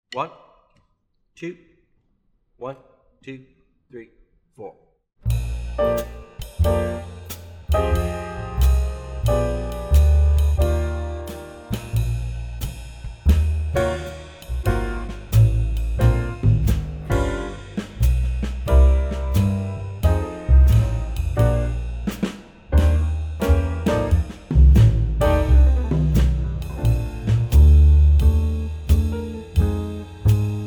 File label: swing etude - full rhythm section play along for winds